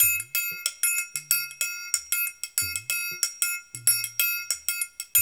AFRO, African Contemporary, AfroTriangle

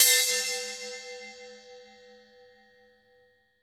SPIN GONG, Drums; Distored Reality 1&2

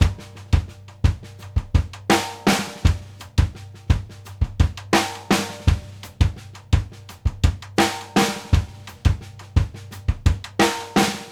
2ND LINE, ORLNS 84; Sampleheads; New York City Drumworks VOL-1; SP ORLNS 84

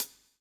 COPS STK; Spectrasonics - Backbeat

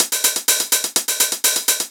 909 Hats Loop 125, Old Skool House

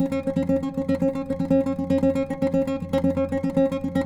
NYL TR

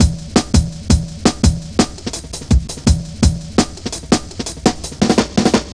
Drum Loops; Zero-G Jungle Warfare 1, 2 & 3

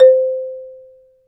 MARIMBA; ILIO

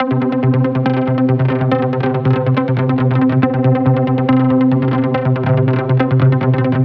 140BPM C; Vengeance - Essential Clubsounds Vol.4

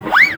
FRET, FINGER MUTE; Xsample VOL-1

